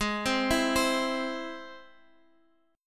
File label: Ab Augmented Ab, Guitar, Half Step Down